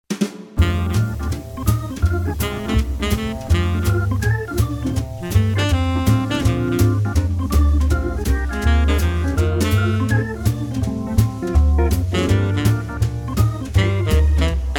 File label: Jazz